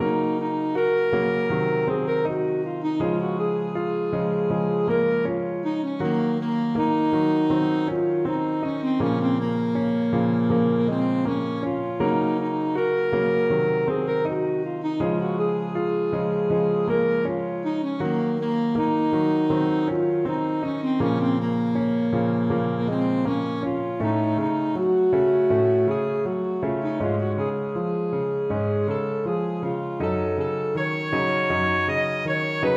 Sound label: saxophone